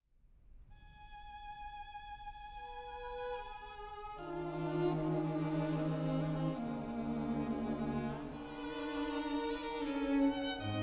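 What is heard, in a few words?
String Trio; Wheelock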